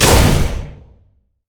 passive shot; LoL; lucian

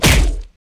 yeti vs flesh; nunu

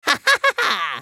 ultimate effort; Rumble

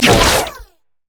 pick a card red card; LoL; twistedfate

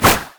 satchelcharge; Ziggs

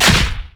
basicattack dragon; LoL; shyvana

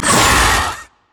spider attack; LoL

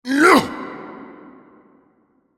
effort; Mordekaiser; Character Voices - English

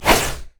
dash spin; LoL; vi

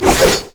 double strike

